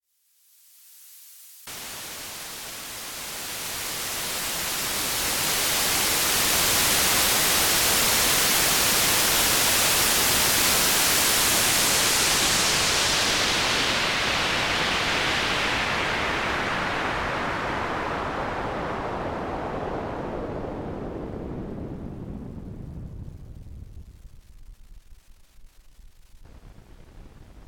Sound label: Erica Synths Acidbox II Noisebox